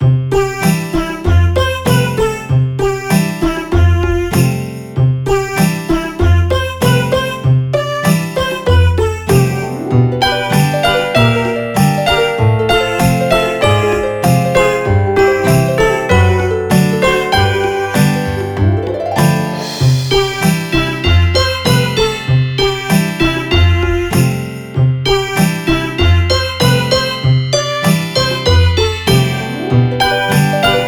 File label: Warm Midwinter Campsite; 따뜻한 한겨울 캠핑장; Cookie Run OvenBreak